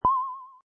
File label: Tremble, Button sound or menu sound; Button Sound Effects; Multimedia; Lynne Music